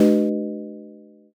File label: baan